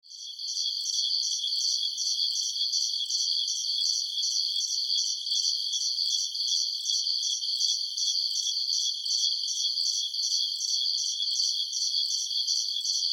Countryside, Ambience, Countryside, Night, Chirps, Insects, Bugs; 5.1; Surround Sound; BlastWave